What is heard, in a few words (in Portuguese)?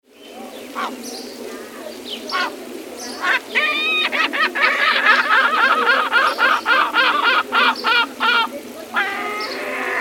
gaivotão